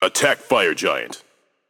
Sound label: vox attack f; smite; baronsamedi